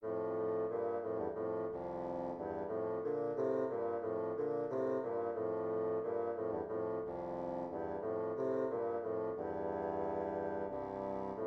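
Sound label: air in F major 2 bassoons; handel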